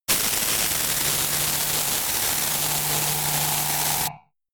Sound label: splatter, sciene fiction